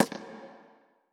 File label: Wet Percussion; Kern